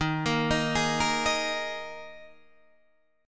D sharp Suspended 4th, Guitar, Standard; arpeggiated; chord; 11,13,13,13,11,11; JGuitar

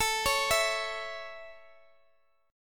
A Major, Guitar, DADGAD

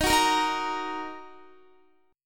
Eb Minor, Guitar; strummed; x,18,16,15,15,17; 4E,4A,5D,5G,5C,6F

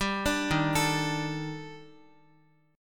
Eb Major Sharp 11th Eb Guitar; EbM#11 arpeggiated; JGuitar

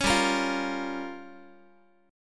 G Minor 7th Flat 5th, Guitar; Gm7b5; strummed

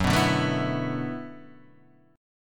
D 6th Add 9th F sharp

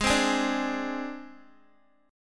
G sharp Major Flat 5th, Guitar; G#Mb5 Chord

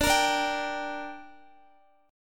D Suspended 4th Sharp 5th D down Guitar, chord; strummed

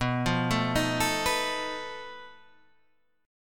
B 7th Flat 5th B, chord; B7b5 arpeggiated; 7,8,7,8,10,7; JGuitar

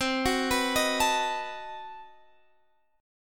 B 7th C; B7/C; arpeggiated

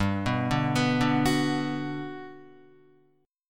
G Major 7th, G, down, Guitar, GM7 chord; arpeggiated; JGuitar

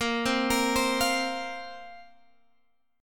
A sharp Suspended 2nd down Guitar Open G

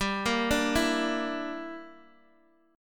G Minor 6th, Guitar Open D

